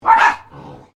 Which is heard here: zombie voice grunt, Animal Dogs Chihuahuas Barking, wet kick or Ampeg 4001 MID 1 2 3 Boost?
Animal Dogs Chihuahuas Barking